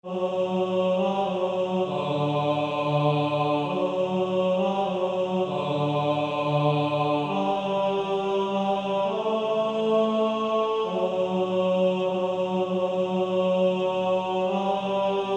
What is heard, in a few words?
Male Choir